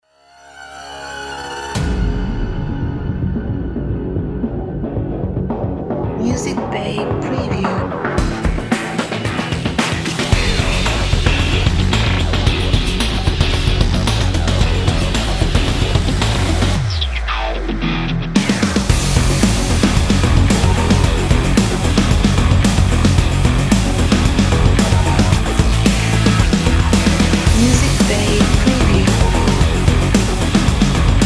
Action Sports Energetic Rock Trailer

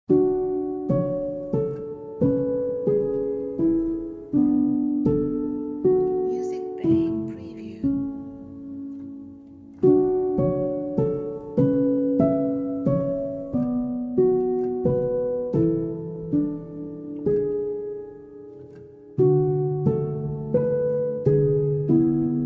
Calm Water

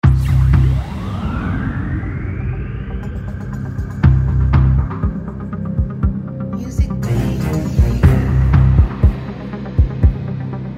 American Jackpot Tension Bed

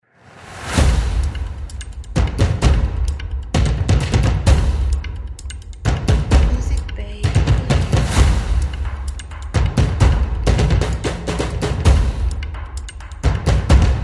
Stomps And Drums Action Advertising

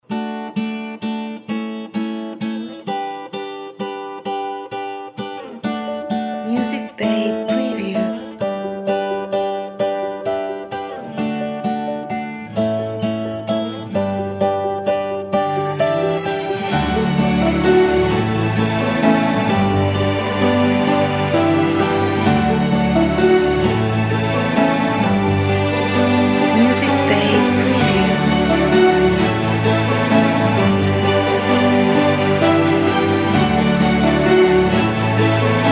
Acoustic Orchestral Inspirational

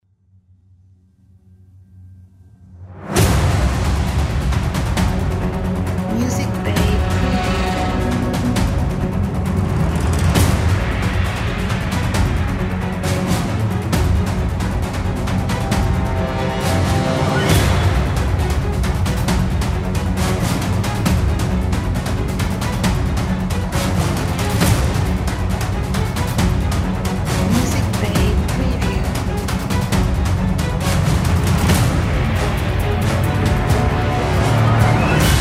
Intense Cinematic Trailer Music

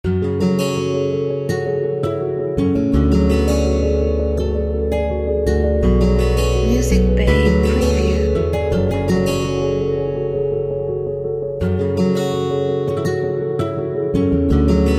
Singing Guitar

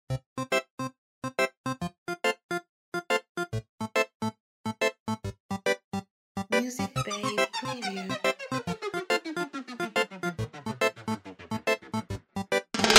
Cartoon Electro Reggae

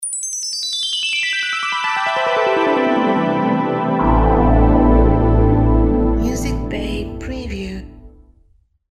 Magical Logo

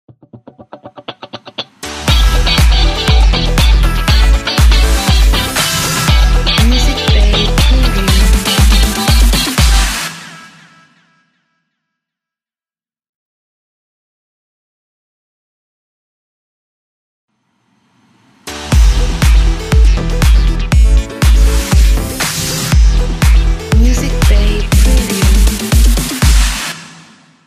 Funky House

